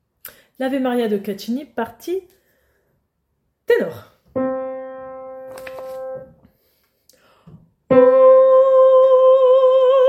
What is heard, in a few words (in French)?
partie tenor